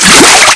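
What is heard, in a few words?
water out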